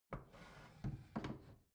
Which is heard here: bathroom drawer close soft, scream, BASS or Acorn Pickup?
bathroom drawer close soft